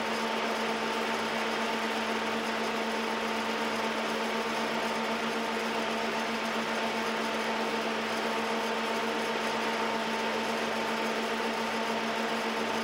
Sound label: Noctua G2 Direct